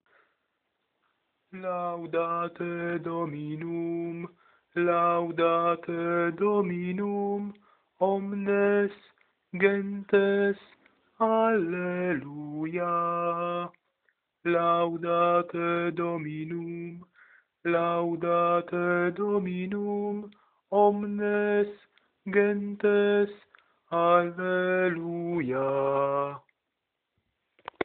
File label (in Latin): Laudate Dominum alt